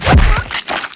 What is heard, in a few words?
splash